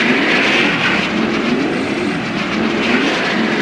turret aa missile inair

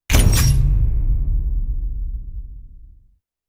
boost ready; Titanfall 2

